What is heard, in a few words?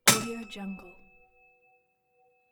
metal hit